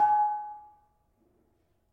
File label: Panerus G sharp 5, gravity harps tuned